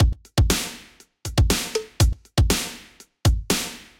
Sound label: Hybrid Latin Rack, drum rack; DM-307A Free Pack by Heavyocity; Latin Rock